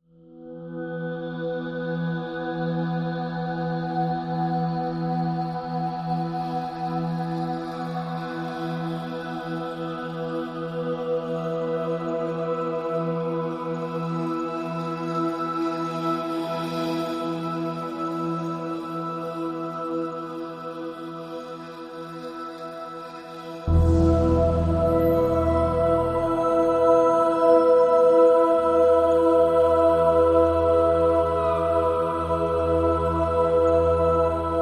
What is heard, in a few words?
Natural Element E No Flute